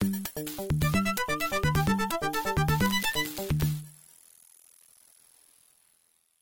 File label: Round Clear; Streets of Rage 3; Genesis